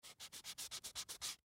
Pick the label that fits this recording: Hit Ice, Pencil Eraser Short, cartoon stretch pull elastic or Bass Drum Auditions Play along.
Pencil Eraser Short